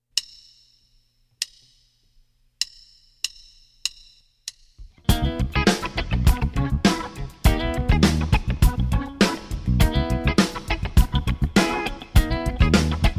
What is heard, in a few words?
with drums